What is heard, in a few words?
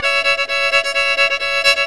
FIDDLE; Northstar - New Gold VOL-1